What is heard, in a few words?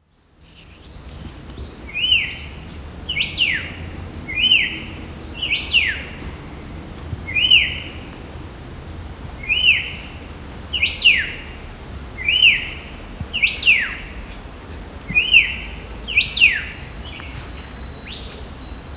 Monarcha Melanopsis; Black-Faced Monarch